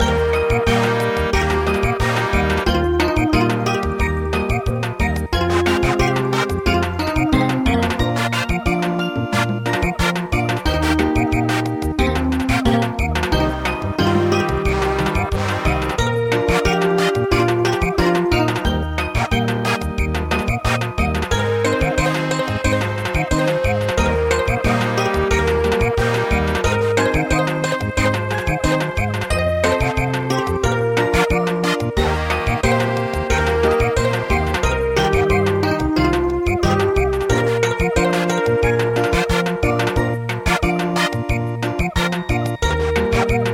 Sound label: Samba